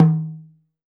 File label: BOMGO OPEN, AFRO PERC; EdgeSounds; Drum Mashines VOL-1